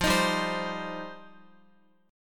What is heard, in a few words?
F 7th Suspended 2nd F down Guitar; F7sus2; strummed